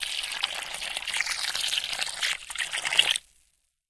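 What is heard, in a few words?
water sounds; zvuki vody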